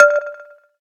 electric, keytone

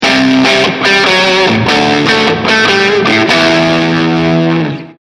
Riff; Riffo2; Sony Ericsson; PlusFourSix

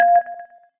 electric star, keytone; SKY IM-A760S-VEGA-Racer

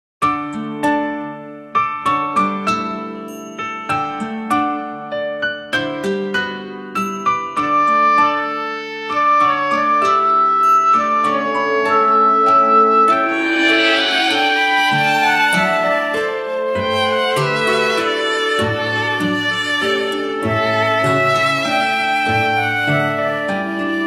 Nokia Mystic

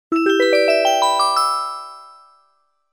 Spring

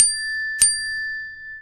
Glass

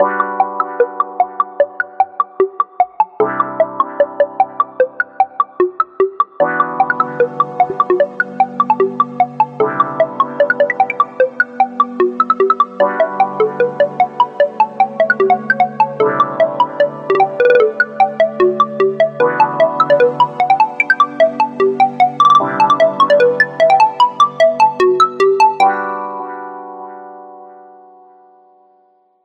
alarm, ringtones; sound of oo website ringtones

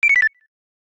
Pen det beep; Samsung Galaxy Note 3

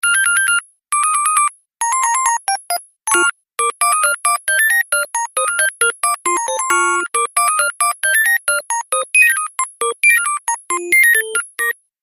Musicbox; LG GD570 dLite

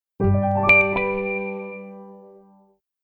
Alarm, phonetones, Nokia; Nokia E7

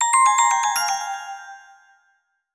E-mail; Sony Ericsson; Xperia X1; PlusFourSix; Venus; Lars Edvall; X1 Family 2

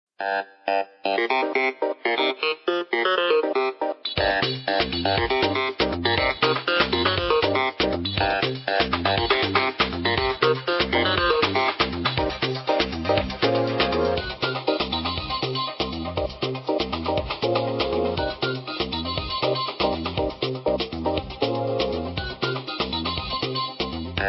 The Electroni Clavi; Huawei C5589

